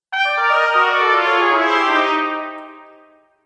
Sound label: Cartoon Failure Trumpet; Cartoon Music